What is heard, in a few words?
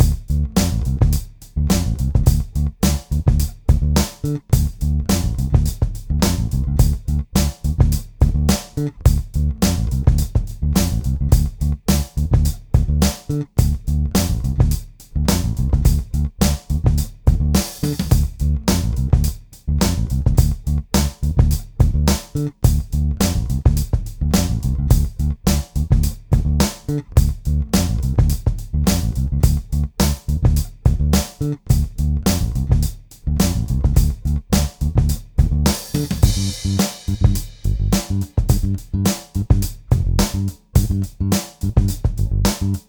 106 Bass Drum